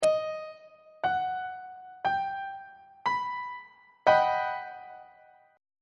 f double sharp augmented major 7th 2nd inversion on treble clef